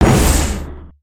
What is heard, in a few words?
Shield Bash; Knight